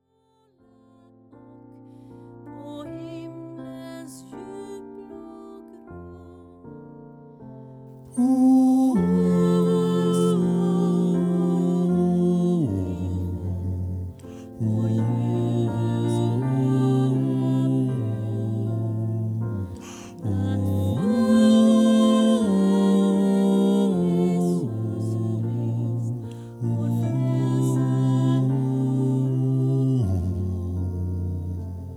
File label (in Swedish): bas